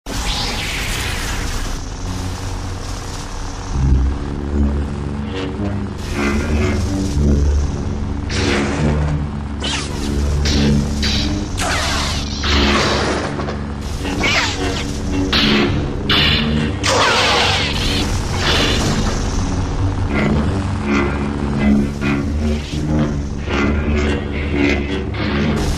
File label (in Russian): bitva so svetovymi mechami